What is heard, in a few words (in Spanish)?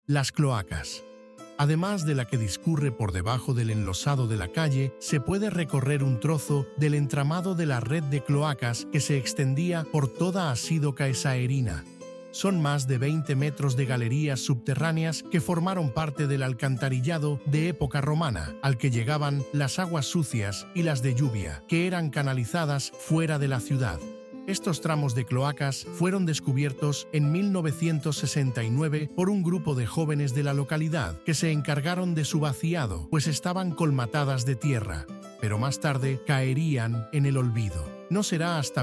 audioguia música